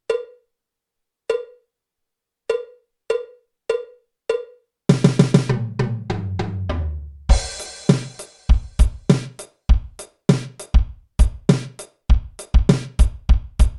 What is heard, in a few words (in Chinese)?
架子鼓独奏 摇滚融合; 66条架子鼓独奏SOLO